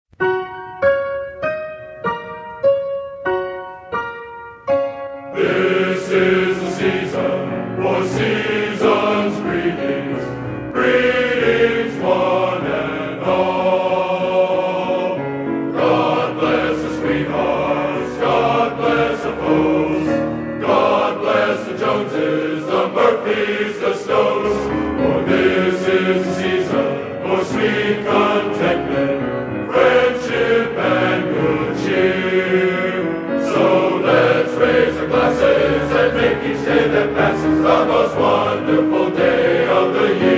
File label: Christmas, Men's Glee Club; Seasons Greetings; USC Men's Glee Club -Pgh